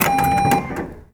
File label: close, atm